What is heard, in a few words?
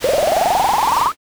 pour